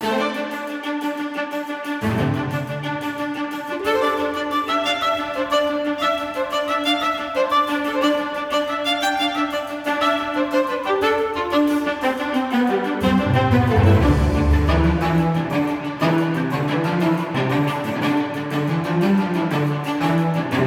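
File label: background music; Pirates of the Caribbean Dead Man's Chest; PlayStation Portable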